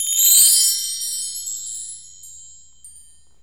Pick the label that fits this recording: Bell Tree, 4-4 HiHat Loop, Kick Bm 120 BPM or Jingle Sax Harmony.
Bell Tree